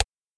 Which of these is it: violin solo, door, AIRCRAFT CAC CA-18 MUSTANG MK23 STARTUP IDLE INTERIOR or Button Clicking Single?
Button Clicking Single